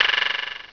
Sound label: VIBRASLAP; Dark Forces Demo; Dark Forces Instruments